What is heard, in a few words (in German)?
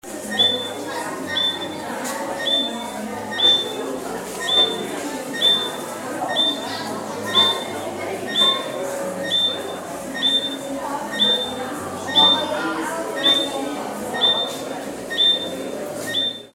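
Pfeiffrösche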